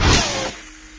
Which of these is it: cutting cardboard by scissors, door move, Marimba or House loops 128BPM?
door move